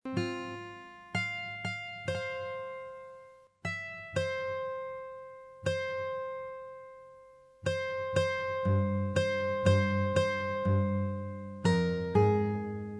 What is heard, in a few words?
Guitar